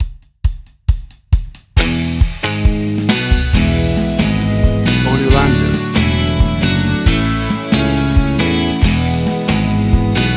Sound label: 60s Country Music